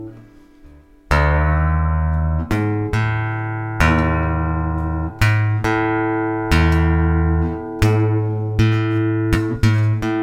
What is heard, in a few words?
Tuning Up Interlude